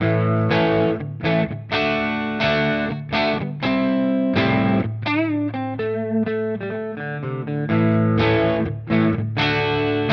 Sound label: Epiphone Prophecy Les Paul Clean Both Modern